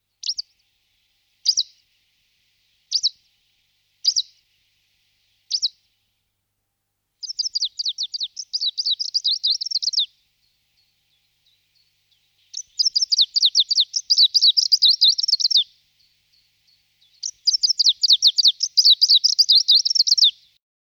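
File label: zelenaya penochka golos, greenish warbler voice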